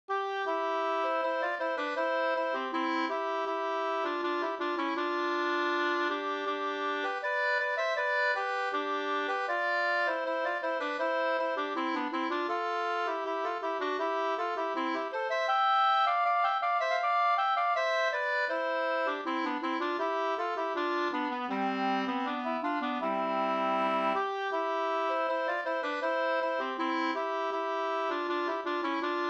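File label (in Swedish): brudmarsch Leksand 2 stämmor; Kers Lars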